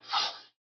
Motion Design Woosh; SoundsCrate

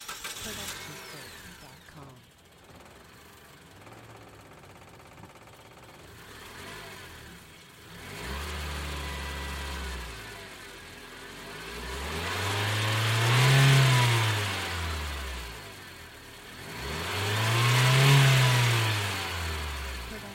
car starting

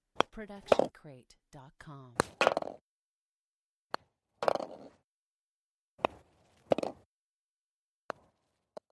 putter and ball fall mono; soundscrate

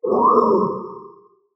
dinosaur grunt; SoundsCrate

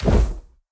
Torch Lighting; SoundsCrate

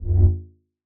large space ship short rumble; soundscrate

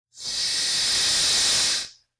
snake hiss

